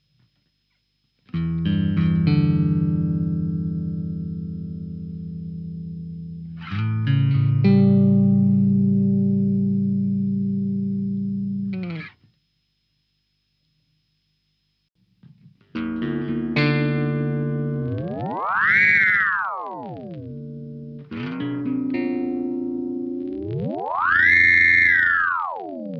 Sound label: Ring Mod